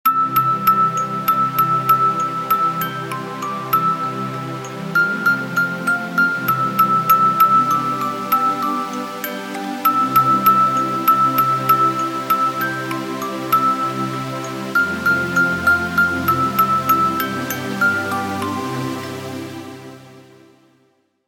jingle bells instrumental ringtone

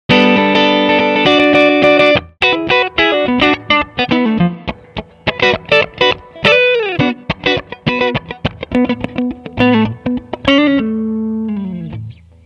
guitar pro ringtone

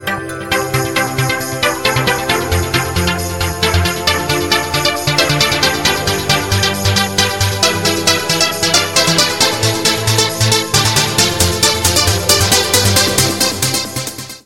christmas synth

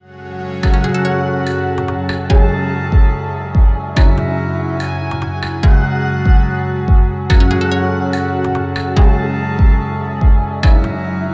ringtone

